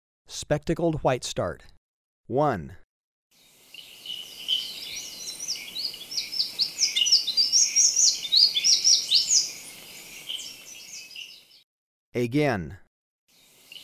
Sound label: Spectacled Whitestart, Birds of Ecuador; S. America